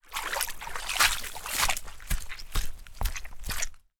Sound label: foley footsteps walk out deep puddle to dry land